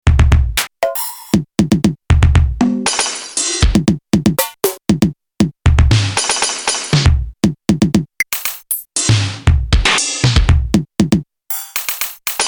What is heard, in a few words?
EMW T-Drum 80 Kit random mod